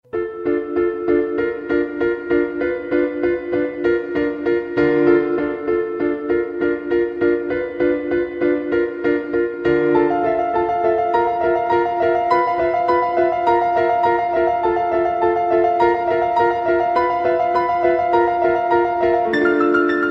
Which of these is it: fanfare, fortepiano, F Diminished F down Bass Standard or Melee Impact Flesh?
fortepiano